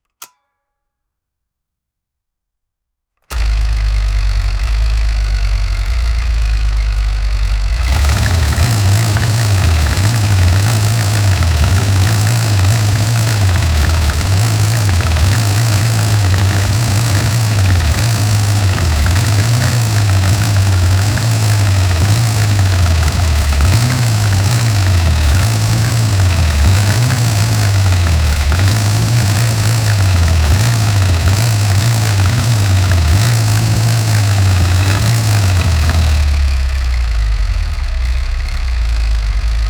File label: v16 diesel with